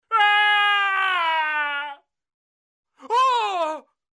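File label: male scream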